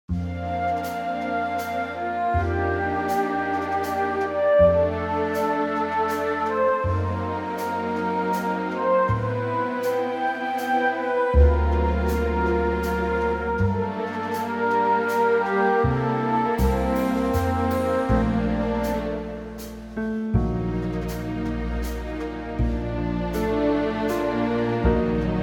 Bb orchestral

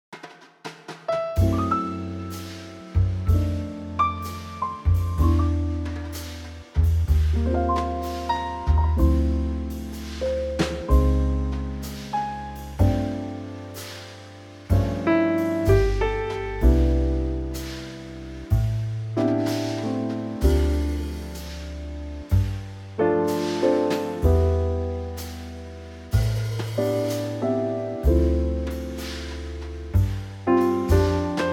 C Trio; key - C - vocal range - C to E

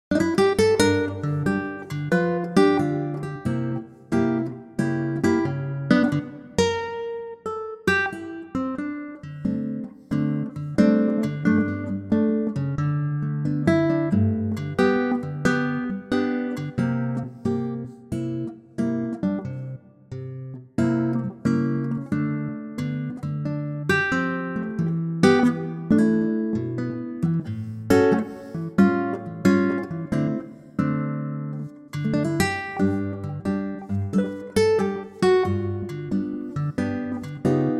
G Ac Gtr

